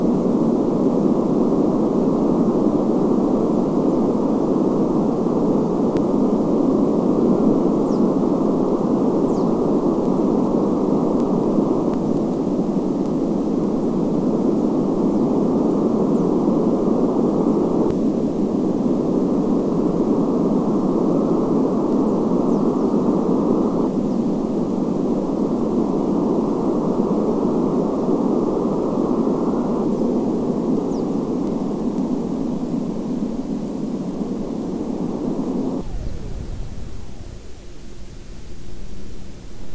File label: WFR audio emfisis